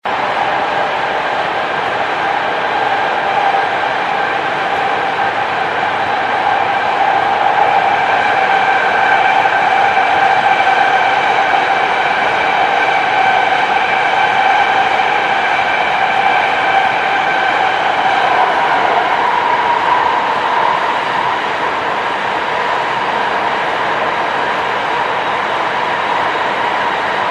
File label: BART howl